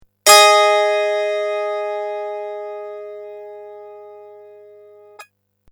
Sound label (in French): Loups Egal